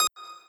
lead

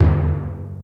march tin

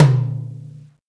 Mid Tom

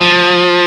Left-hand vibrato; osu

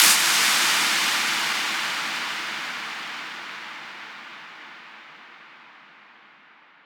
edm clap

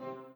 piano

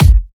kick screaming

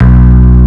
bass; osu

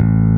bass

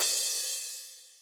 crush; osu

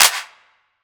Clap; osu

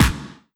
Clap Kick Fast Low Beat

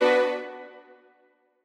Strings

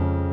piano low

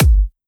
soft hit whistle

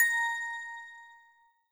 bell

